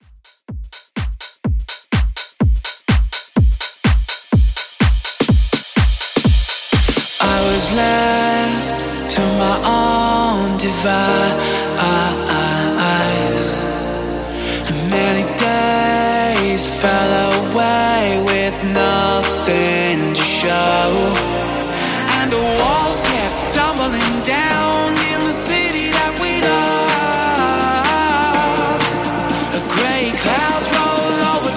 Dance Club 125Bpm

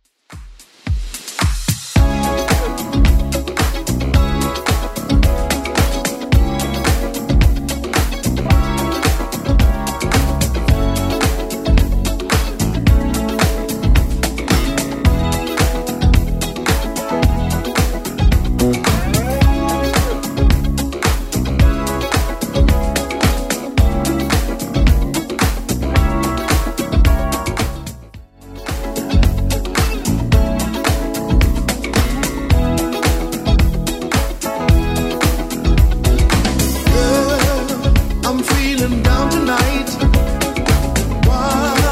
80s Club 110 bpm

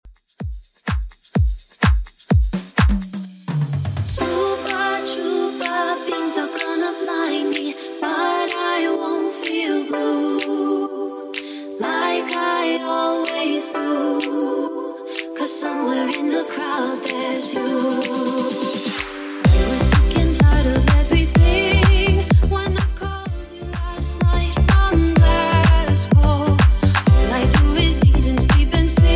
70s Dance Redrum 126bpm

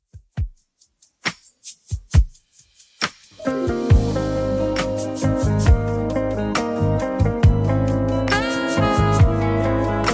70s Redrum 98bpm

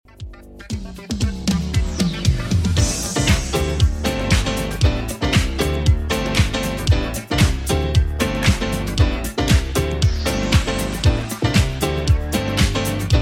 80s Redrum 116 bpm

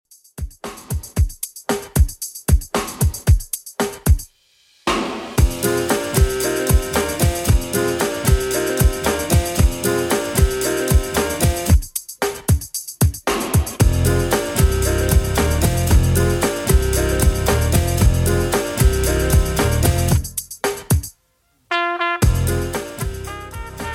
90s Redrum, 114 bpm

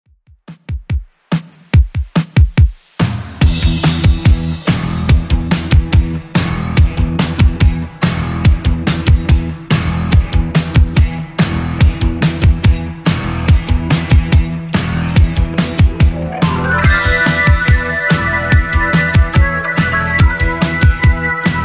70s Redrum 143bpm

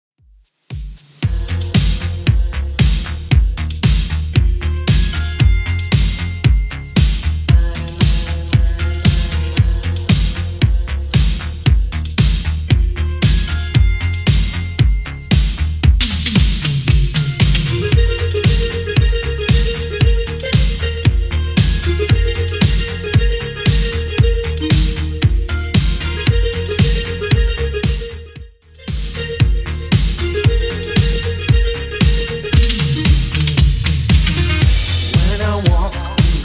80s 115bpm; Redrum

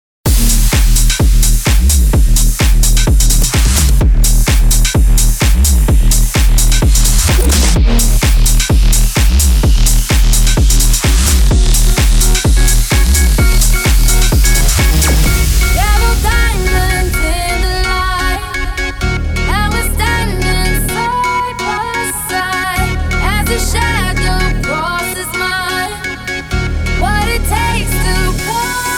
tech house breaker 128 bpm

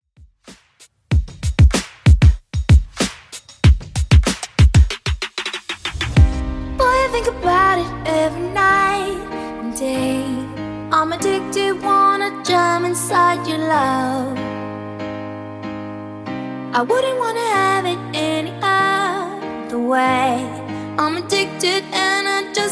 2ks Redrum 95 128 bpm

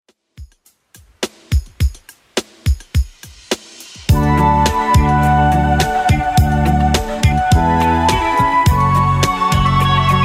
80s Redrum 105 bpm

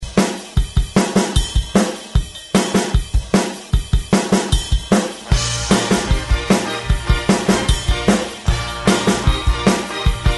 ReDrum 150 Bpm; DJDOLLS

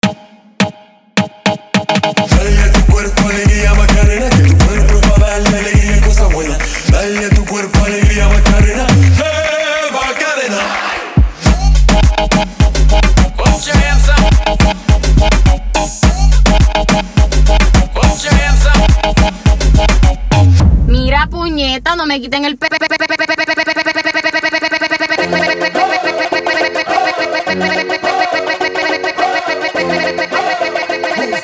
Moombha Break

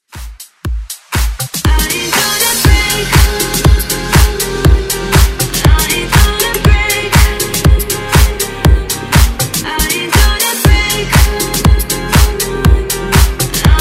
Pop Dance; Redrum

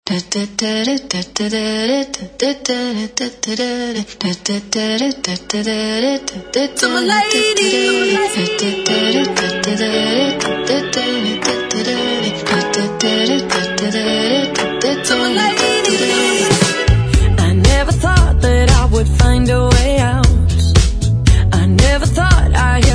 Hype Acap intro

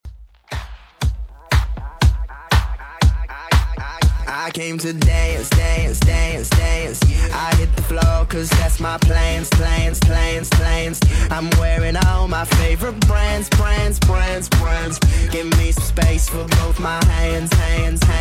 Redrum 120bpm

